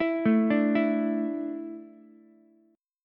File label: A Suspended 4th A down Ukulele; Asus4 arpeggiated; JGuitar